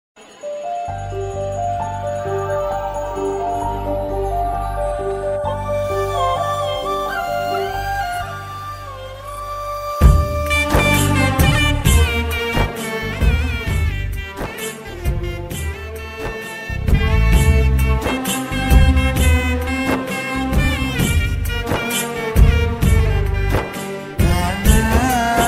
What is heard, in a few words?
sad ringtone tamil sad ringtone tamil ringtone; Varuthapadatha Valibar Sangam